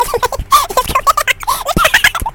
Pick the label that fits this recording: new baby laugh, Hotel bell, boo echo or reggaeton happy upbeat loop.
new baby laugh